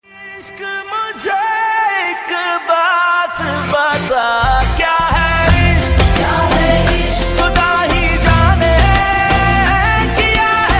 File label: Ost pakistani ringtone